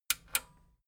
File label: metal button radio click disable slow